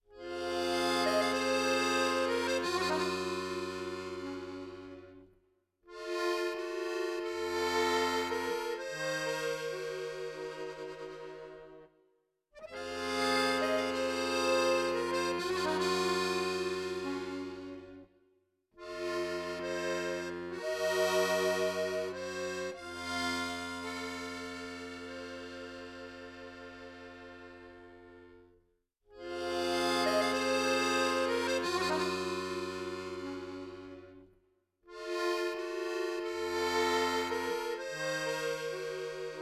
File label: Accordion; legend of zelda the breath of the wild the champions ballad